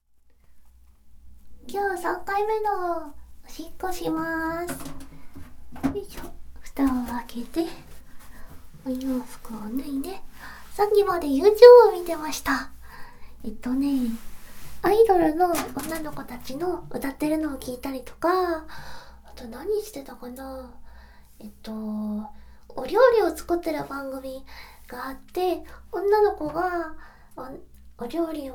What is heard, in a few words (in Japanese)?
おしっこです, ASMR